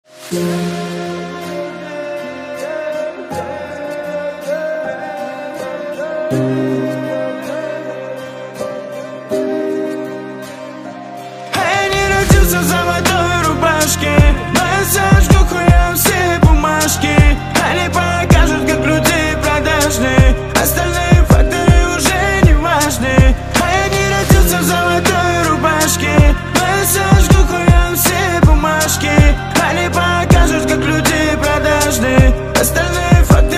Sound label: groove